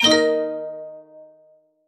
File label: koto buzzer effect